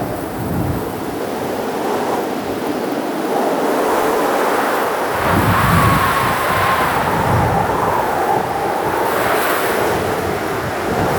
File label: Mountain Pass Heavy Wind Loop; Ambience; Warframe; The War Within